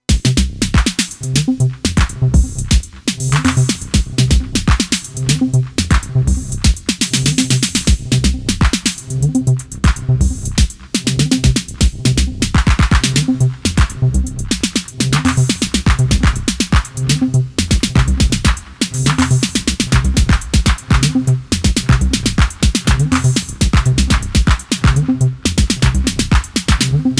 Roland T-8 Beat Machine Step Loops

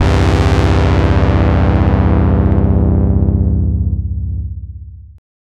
2 Pole Filter seep; IK Multimedia

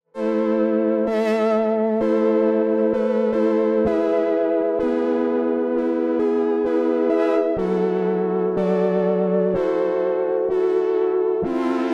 String

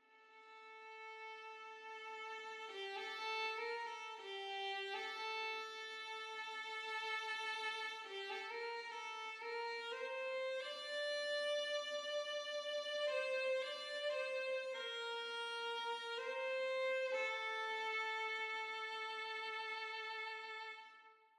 Solo Violin Classic Mix; Nucleus

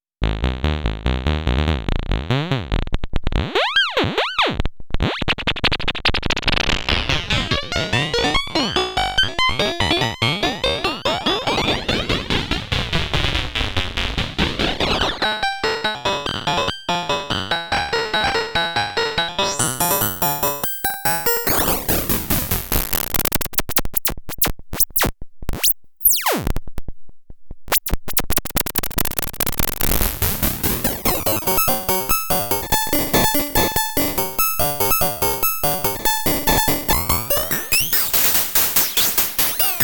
BASSLINE CV FM Sine

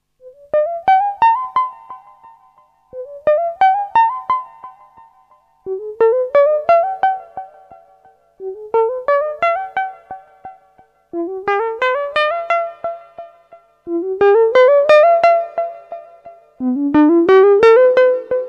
Pulse

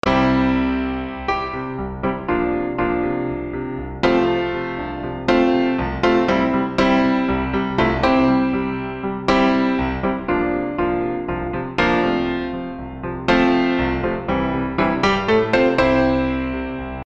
August Förster Piano

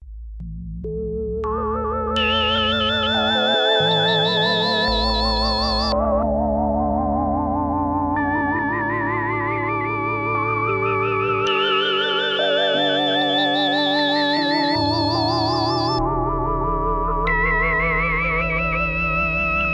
Polysix Poly

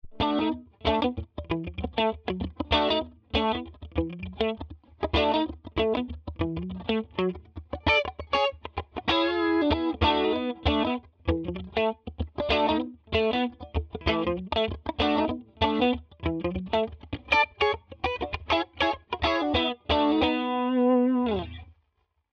Tone King Imperial MKII Funky

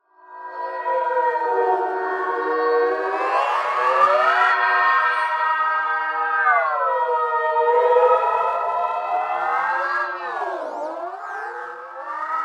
Pads Glass Dome

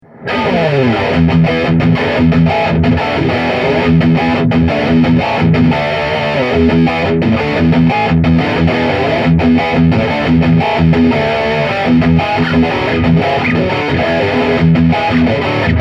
Zoom G6

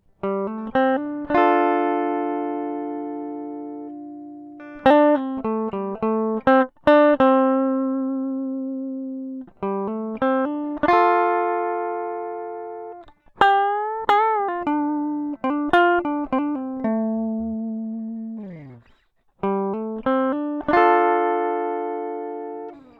Guitar

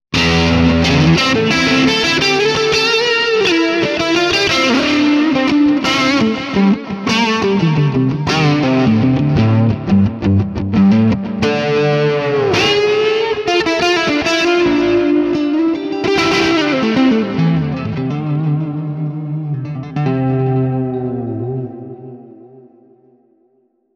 Mesa Boogie Smooth Delay; Neural DSP

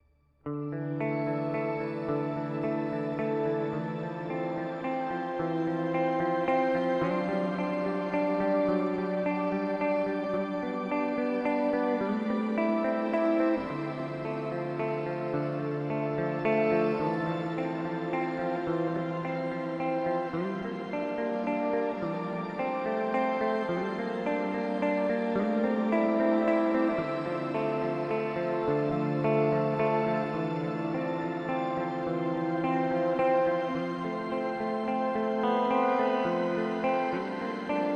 Eventide H9 Dark Shimmer

